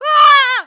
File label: Scared; Ravio (unmasked) scared when the Sacred Slate explodes; zelda